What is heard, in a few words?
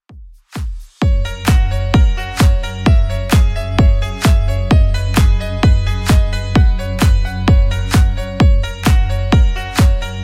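House Redrum 130bpm; 2ks DJ Friendly Redrum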